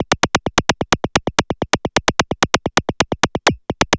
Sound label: 130 bpm